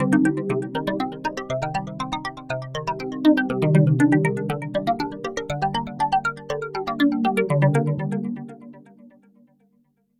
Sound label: Phase Pluck, Arpeggios & Sequences; Dr. Fink's Funk Factory